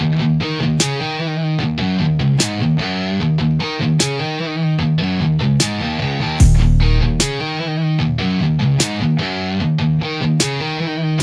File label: Noise; Shattercode